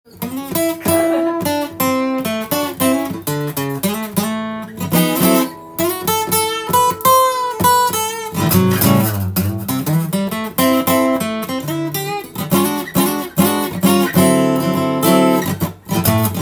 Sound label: yamaha ll, guitar